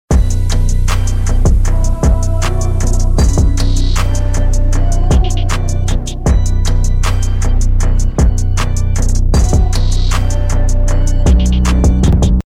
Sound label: start round, music